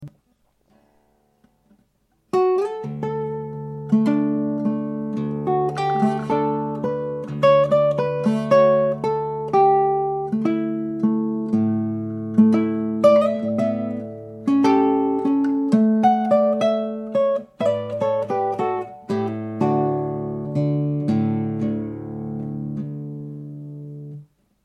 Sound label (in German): Pop Classic Wohnzimmeraufnahme mit DAT Rekorder ohne Soundeffekte ohne Klangbearbeitun, guitars